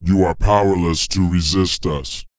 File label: PITCH LOW, Vocal, SCI-FI; 5 SCI-FI CHZ